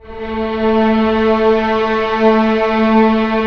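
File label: STR A2 PP, Orchestra Strings; Sound On Sound - Peter Siedleczek's Orchestra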